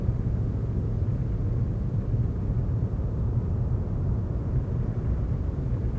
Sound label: cave ambience; background